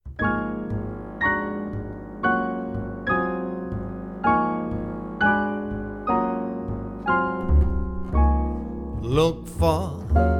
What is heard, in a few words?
piano trio